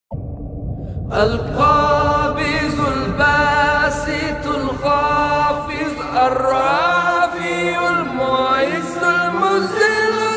arabic ringtone; 99 Names Of Allah

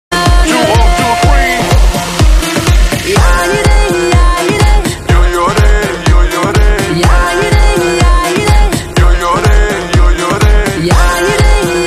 BGM ringtone

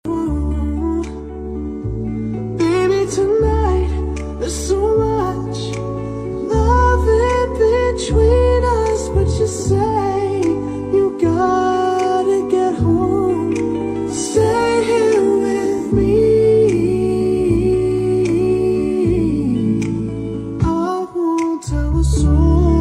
english ringtone

